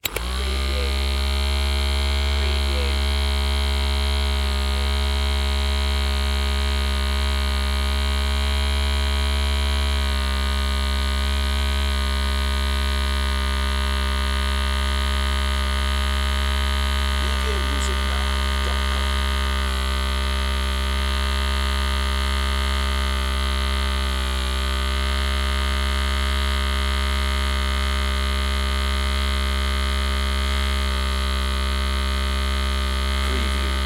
Foot Massager; sound-effects-bible

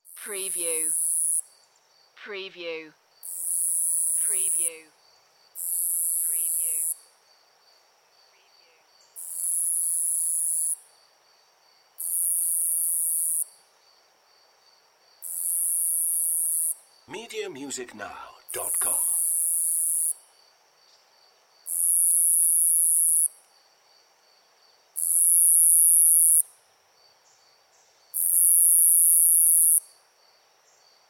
Forest Birds

